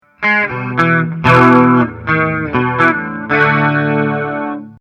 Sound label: Jazz Clean